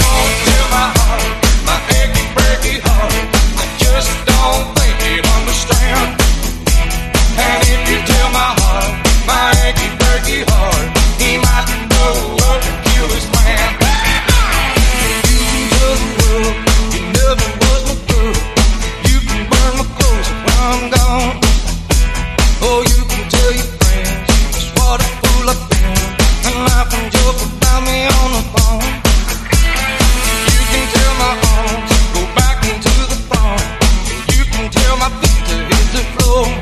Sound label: 126bpm Em